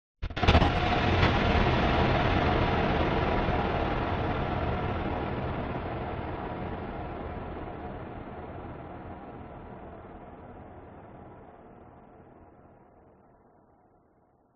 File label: cinematic hit stab stutter large reverb; sound design